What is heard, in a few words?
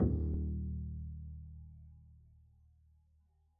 pizz eb1, double bass; dsmolken